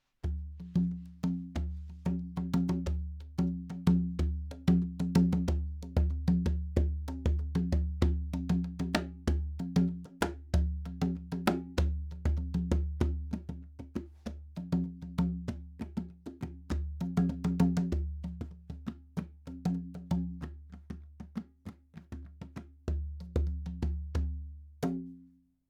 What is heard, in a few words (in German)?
soundbeispiel konikdrum gross